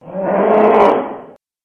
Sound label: monster bear